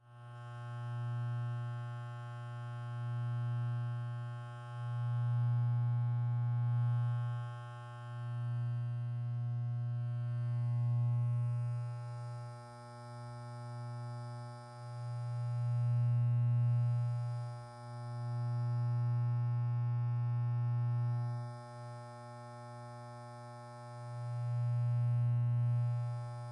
ASMR loop buzzing clippers hum moving bare extended mono; Sonniss GDC